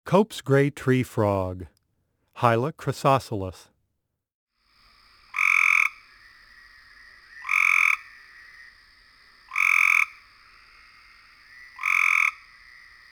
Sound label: Copes gray treefrog; amphibians; frogs and toads; frog calls